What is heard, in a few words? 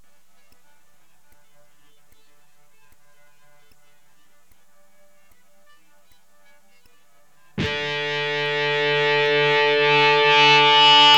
E GTR POWER CHORD